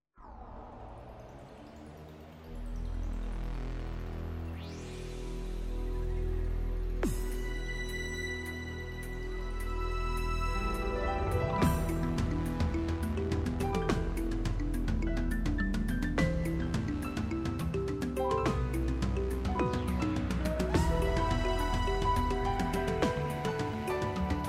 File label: alesis; MIDI environment from the 1990’s